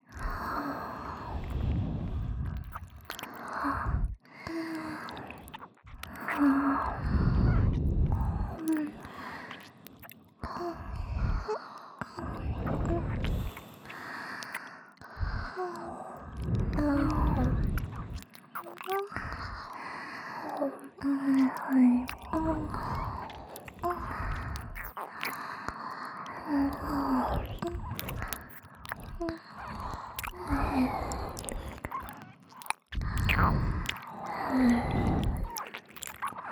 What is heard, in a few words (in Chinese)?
雙耳接吻舔舔; 附錄迴圈（自慰輔助）; 耳邊